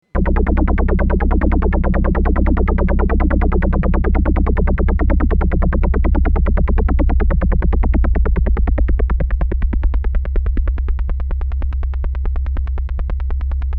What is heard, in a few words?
JU-06A Envelopes